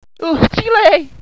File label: hostage pain; cstrike